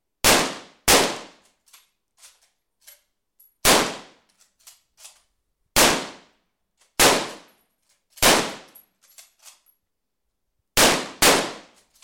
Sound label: Lee Enfield Gunshots